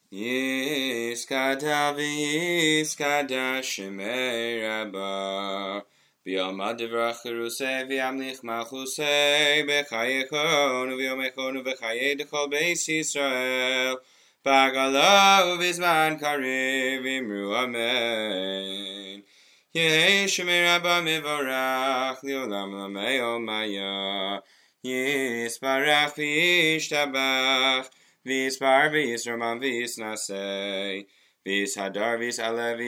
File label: Kaddish and Barchu; Shacharit